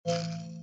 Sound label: button denied; kena bridge of spirits